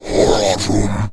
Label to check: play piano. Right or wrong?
Wrong